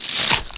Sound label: chain; Hexen2; assassin